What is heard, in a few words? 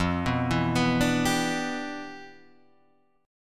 G 6th F; G6/F arpeggiated